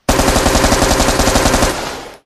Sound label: full auto ak74